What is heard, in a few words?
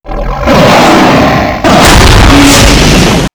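Dragon Attack Skill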